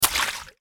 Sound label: footstep water